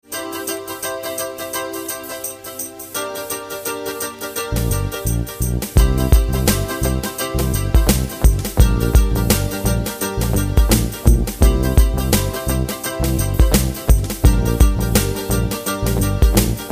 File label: Dance to the guitar